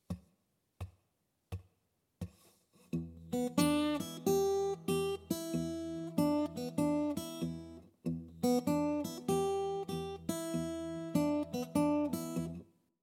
Open Tunings For Blues Guitar; An Easy Guide To Open Tunings For Blues Guitar

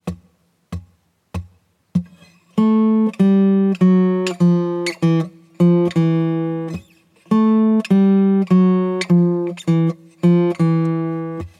Blues Guitar Turnaround

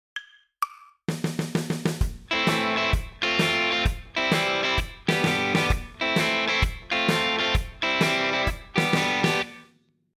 rock guitar chords

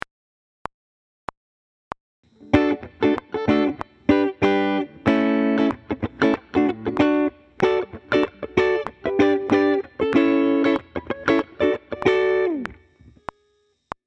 Funk Voice Leading

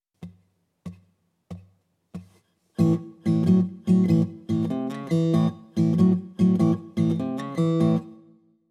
Open Tunings For Blues Rock Guitar; An Easy Guide To Open Tunings For Blues Rock Guitar

